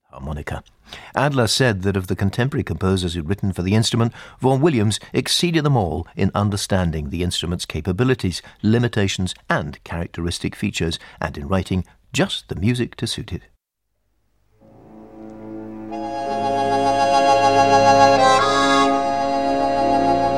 romance in D flat major for harmonica strings and piano; ralph vaughan williams